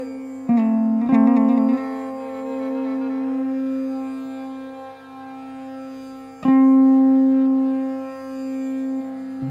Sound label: Hemavati topic phrase S, ālāpana; topic phrases for S